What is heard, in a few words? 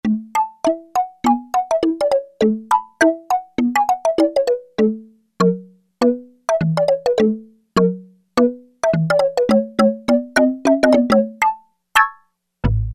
Bottle Marimba, Roland MKS-70